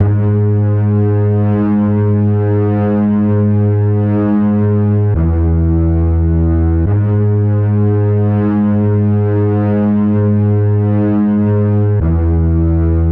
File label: bass